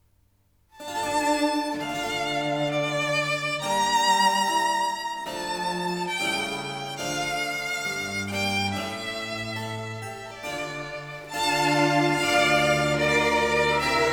Violin Sonata in D minor, op. 5 no. 7- 3. Sarabanda; Corelli